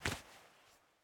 Take Mobile; MiSide

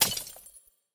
Shield Destroy; Game rip; MiSide

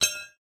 Metal Drop; Game rip; MiSide

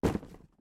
Box Get; Game rip; MiSide

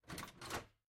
Door Open; MiSide

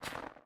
Step Snow; Game rip; MiSide

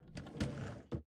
Open Wardrobe; Game rip; MiSide

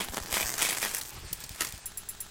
Maneken Broke; MiSide

